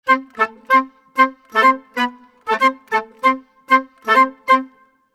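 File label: 95 woodwinds mix, Orchestral arrangements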